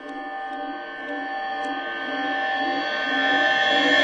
reverse ringing swipe; Gongs and Super Crashes; single hits

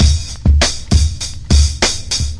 100 distorto kick groove, drum loops and breaks; 091 to 100 bpm

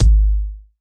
kick; drums single hits

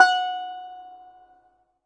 koto sounds; WORLD

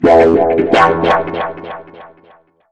guitar echo out

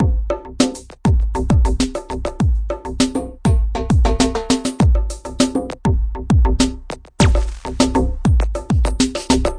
lame funker, drum loops and breaks, 100 bpm; 091 to 100 bpm

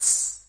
gungru, African and Eastern Percussion

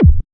gliss down, Kicks; single hits

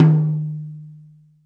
real TOM, REAL LIVE KITS; A Real Freakin Drum Kit

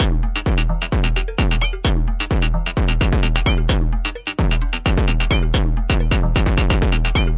130 bpm beat, drum loops and breaks; 121 to 130 bpm

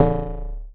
mean analog pling

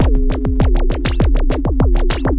glitchy zaps, drum loops and breaks, 100 bpm; 091 to 100 bpm

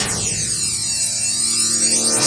Electro FX; Ueb HE Electro FX

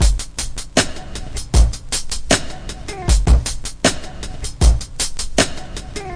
bigfish vox break, drum loops and breaks, 078 bpm; 000 to 080 bpm

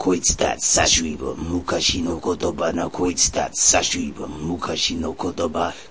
162 male vox; MELODIC LOOPS; VOCAL OR VOXY LOOPS

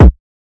Hard Kick Drum, Hip Hop; drums; STYLE KITS (Hip Hop, Etc); Khezie Hip Hop Kit

